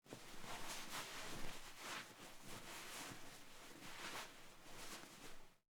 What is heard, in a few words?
drying hands; bathroom